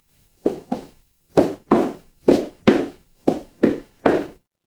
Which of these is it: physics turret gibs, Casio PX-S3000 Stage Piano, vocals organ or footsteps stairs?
footsteps stairs